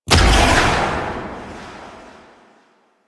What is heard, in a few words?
Blade Explosion; Enemies; Warframe; Warframes; Pax Duviricus